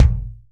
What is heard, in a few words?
kick single hit g key, Royality free kick drum tuned to the G note. Loudest frequency: 209Hz; kick drum samples